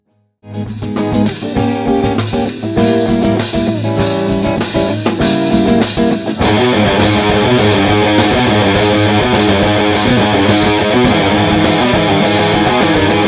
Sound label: guitar